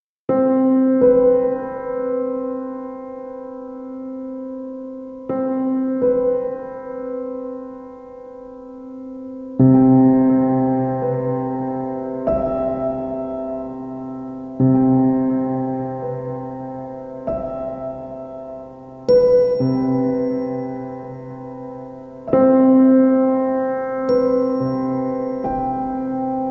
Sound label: Piano Sounds